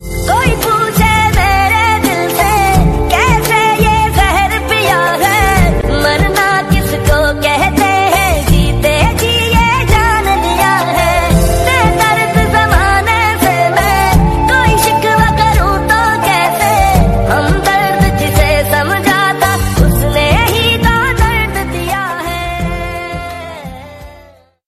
romantic break on music

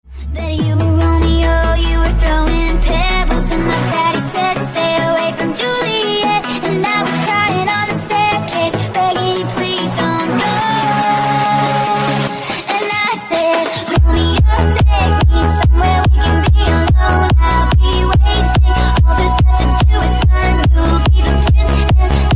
love remix

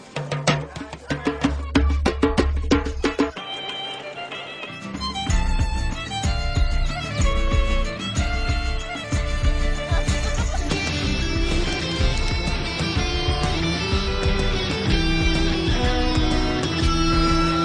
commercial

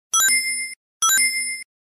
ring sound game; sonic

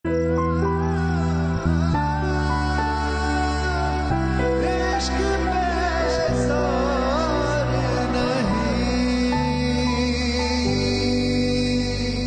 music

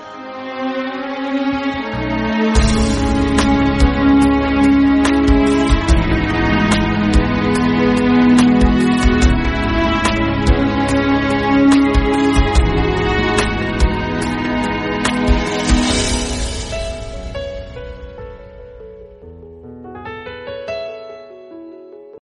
romantic

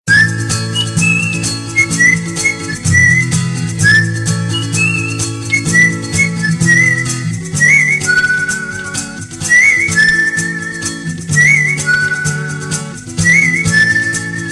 whistle; kodama simham

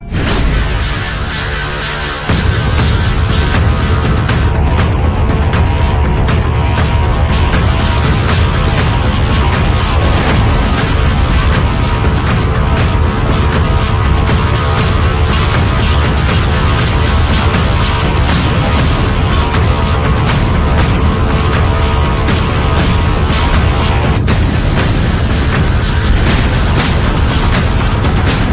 trailer music; expendables 2

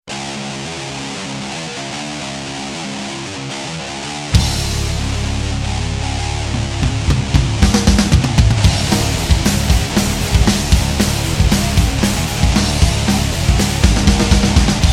loud theme; blade

